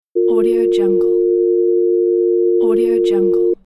dial tone

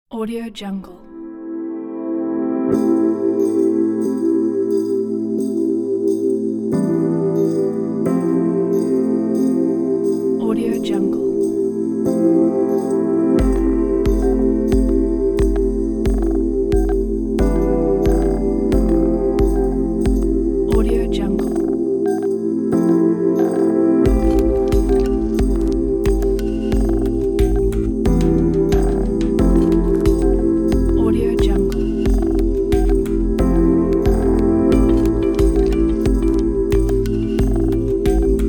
future chill atmosphere

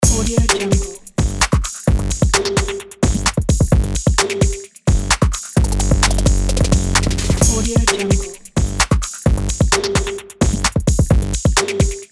the breakbeat loop

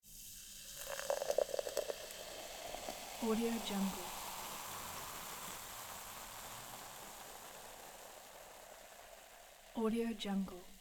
liquids pouring

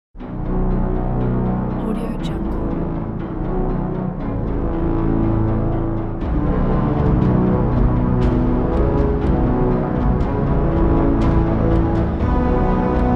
inspiration time patriotic background

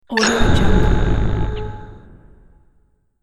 robotic servo stomp

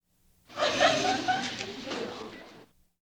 crowd small audience

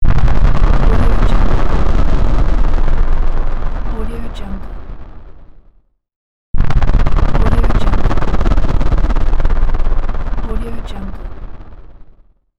sonic boom

